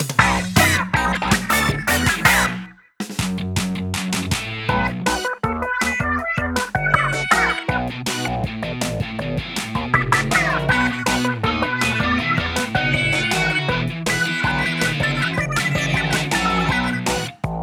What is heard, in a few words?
Win!; Tableturf